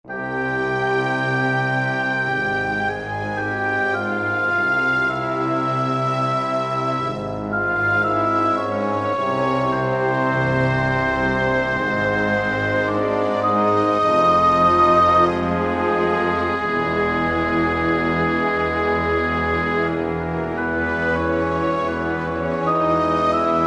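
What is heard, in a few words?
Orchestral Oboe, Yamaha PSR-3000; Factory Voices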